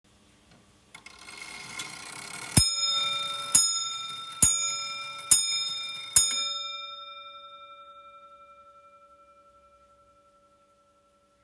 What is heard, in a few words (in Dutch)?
Friese Stoelklok Antiek